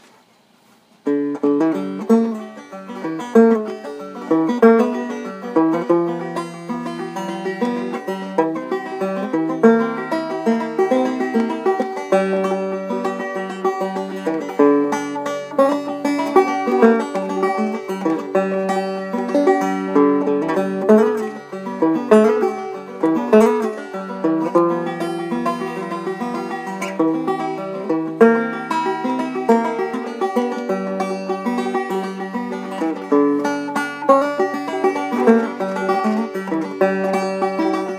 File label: banjo; Get em up and go